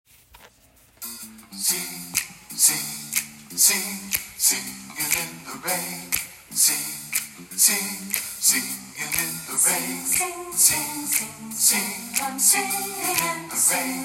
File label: Choir